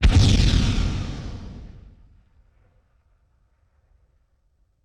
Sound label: WWII Explosion With Flare; Period Combat; Sony Pictures Sound Effects Series